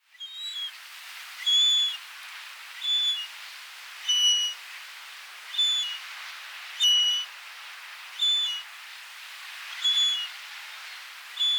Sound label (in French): Goéland leucophée (Larus michahellis) - Cris d'un jeune oiseau; Cédric Mroczko